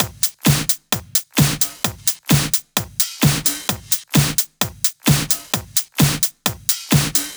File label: 130BPM Kit; Vengeance Samples; Comboocha Kit